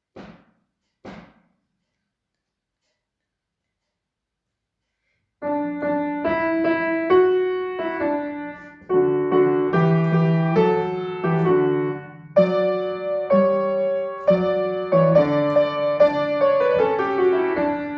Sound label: CANON; P.Hayes